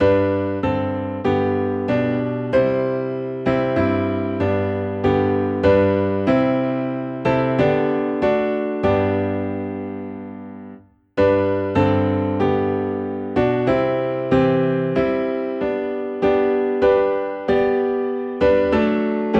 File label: Piano in G; Tis So Sweet to Trust in Jesus